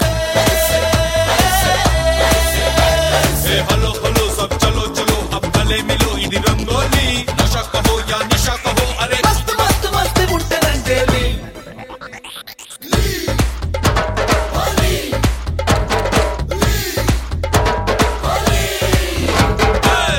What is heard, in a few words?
Holi Beat Song; ringtones